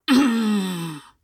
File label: Shield Death; Col Shield Death